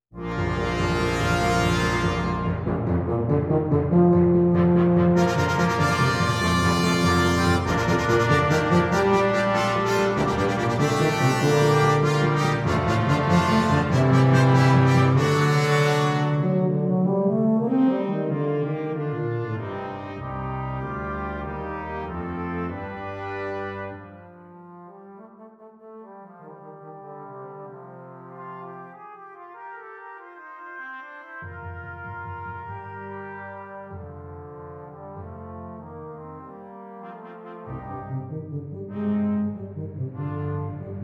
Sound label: tuba marcato; 1:10, for 2 trumpets 2 trombones, tuba, & timpani